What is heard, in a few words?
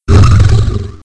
Werewolf Attack; Playable Tutorial Level